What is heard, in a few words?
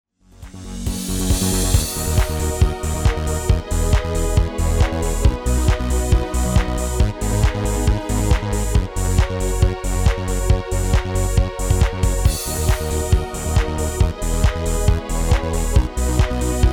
MIDI